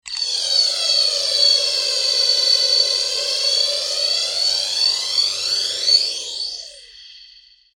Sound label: Science Fiction Servo Motor; Spacecrafts